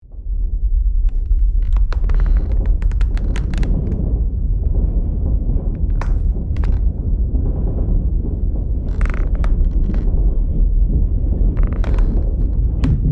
Deep earthquake wooden cabinet creaking and vibrating